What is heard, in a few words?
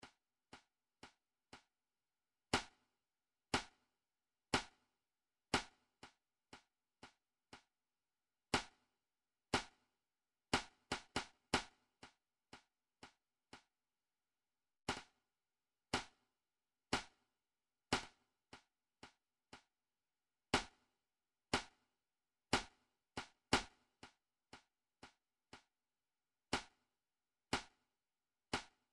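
sticks; rumtrax library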